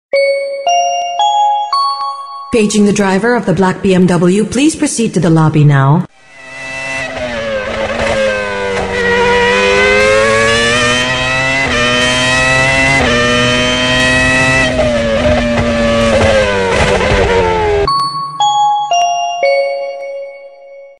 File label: Bmw F1